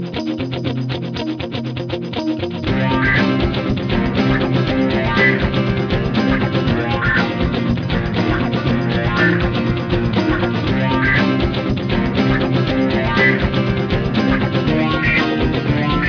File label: guitar rock in blue